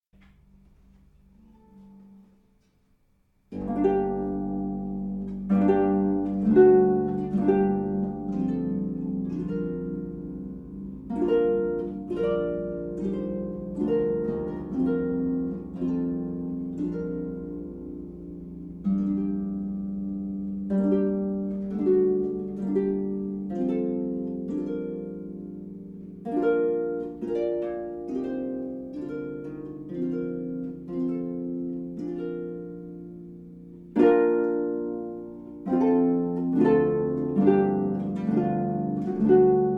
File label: French Pavane, harp; Anonymous